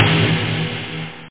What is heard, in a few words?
snare; OctaMED SoundStudio V1; drumkit